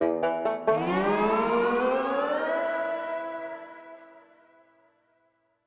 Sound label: Music Stinger